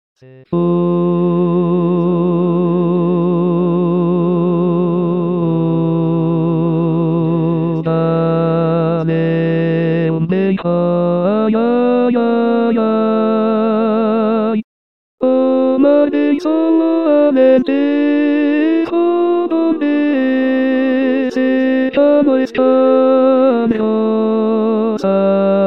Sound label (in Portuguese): se fores ao alentejo tenor